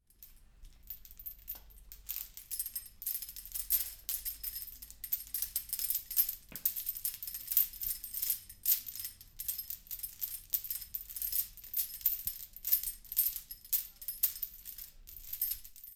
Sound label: Chains